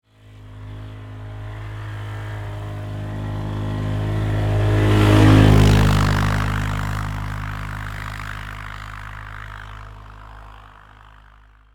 ATV Pass By, Sports, Atv, Pass, By, Close, Medium, Speed, Polaris, 500Cc, Four, Wheeler